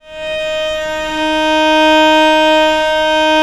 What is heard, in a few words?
VL D#3, VIOLE, Historical Instruments; USB Soundscan vol.23